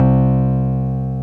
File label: KEY FM, FM Clavi; Club-50 - Foundations Roland; Clavinets